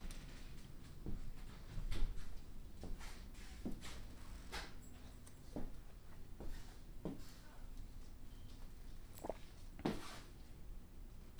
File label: Creaking Floor; Working With My Grandma 4.28.2016